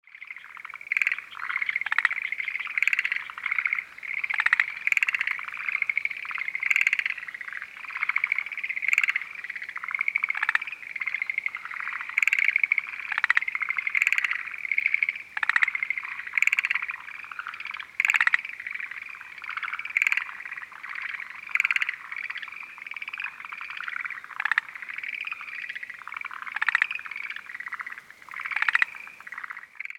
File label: Forest Green Tree Frog